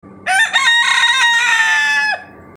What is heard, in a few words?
rooster